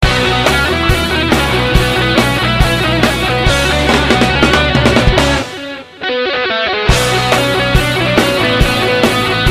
Naagin Mix Ringtone

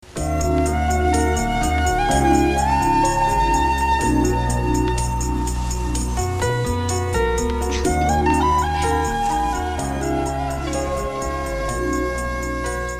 Love Flute

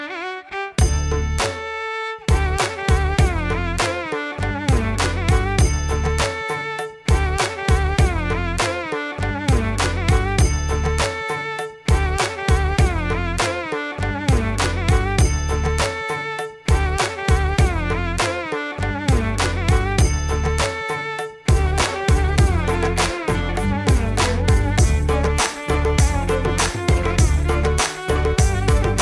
Jingles; Asian Paints

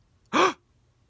Gasp

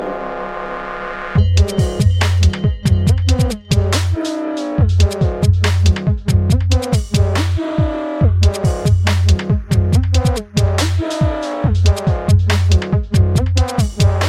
Incantation Dub

